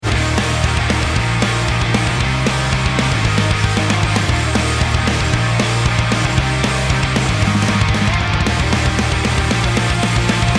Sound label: bomb ten sec count, music